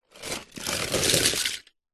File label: leisure toy cars in plastic tub tip out onto carpet; hotwheels